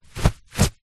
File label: foley clothes running shorts polyester fast movement handling